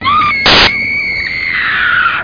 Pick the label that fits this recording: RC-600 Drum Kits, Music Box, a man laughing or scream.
scream